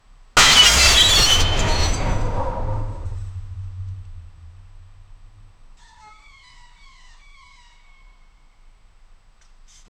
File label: sudden tire screech loud, accident